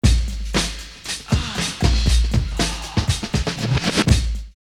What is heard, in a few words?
Moany Groove 118, drumloops OldSchool; X-Static Goldmine